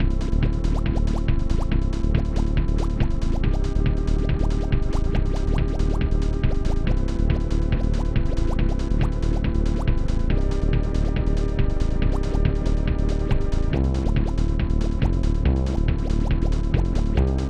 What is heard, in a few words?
The Forest (Tension ~ Ambience); soldiers of fortune 1993 snes